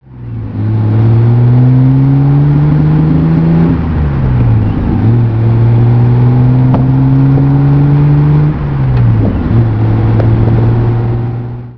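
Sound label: Healey 100, classic car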